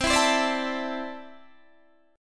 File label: C 6th Add 9th C down Guitar DADGAD